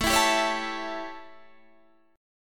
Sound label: A 7th A, Guitar Drop D